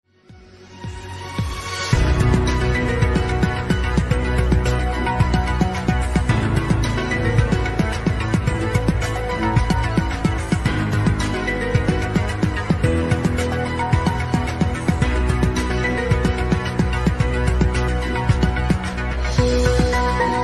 Samsung Galaxy S23

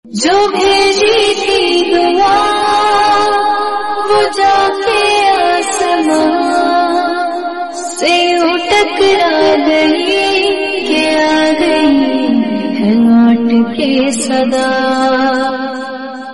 New ringtone

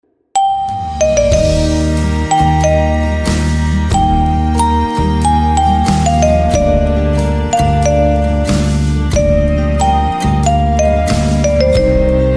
Vibraphone

